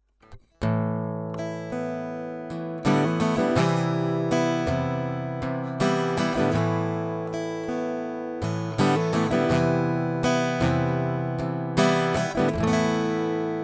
Akustik Git Big Amp Piezo

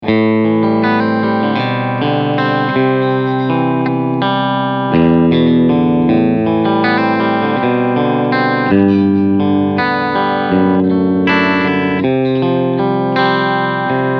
Cleansound Steg HB SC Mode

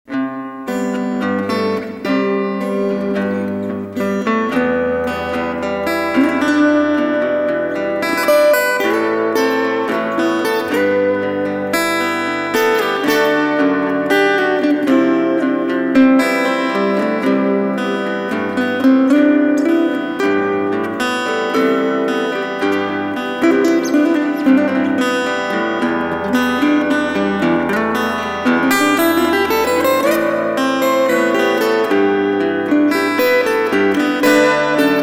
Small Body Akustik Duett

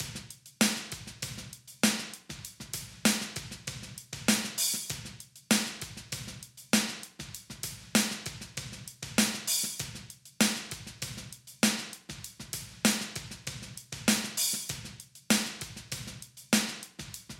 Channel Drums EQ Hoehenanhebung 4dB 3 12k; Flux Evo

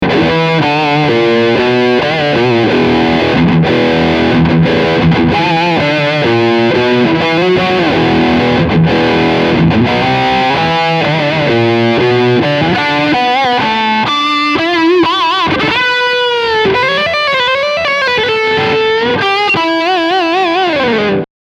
Leadsound Steg EMG

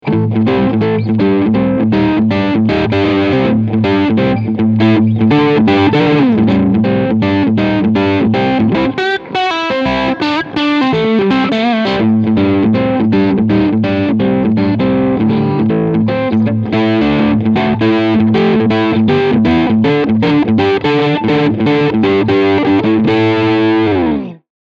Overdrive Steg Mitte

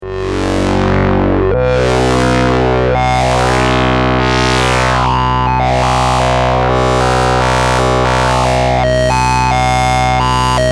Sample + Hold dann Duophon und FM auf zudrehen

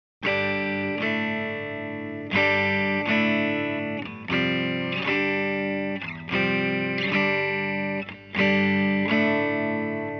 Kanal 2 clean

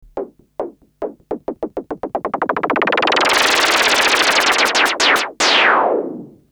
Rauschen Filter Modulation

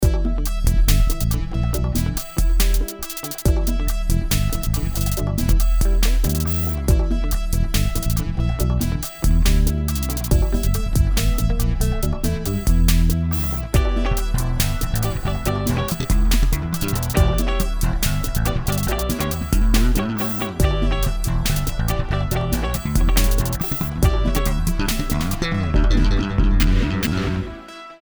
magnetismus 2 Summe neutral

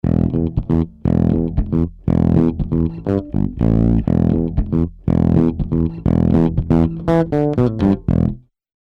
E-Bass Hard Drive EQ; SSL Fusion

